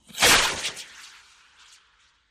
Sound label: Solid Fuel Rocket: Launch And By. Stereo; small rockets